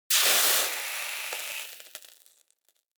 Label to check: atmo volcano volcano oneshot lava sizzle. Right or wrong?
Right